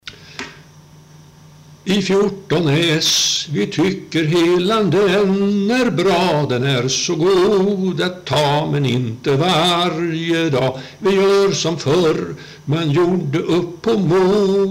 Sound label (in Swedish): snapsvisa